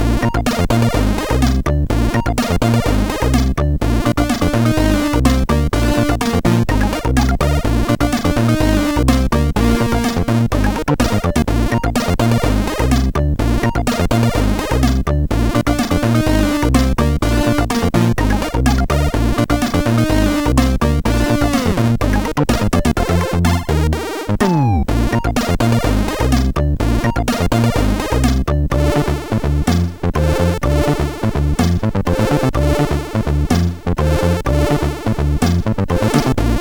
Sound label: Rumble sid MOS6581R4; Jadawin; hvsc